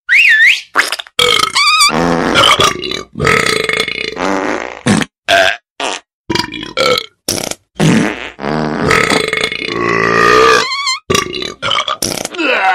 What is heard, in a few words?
farting n burping